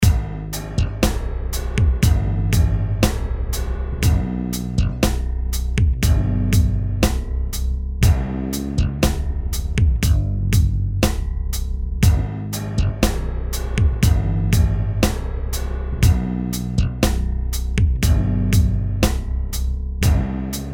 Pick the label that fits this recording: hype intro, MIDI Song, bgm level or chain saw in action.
MIDI Song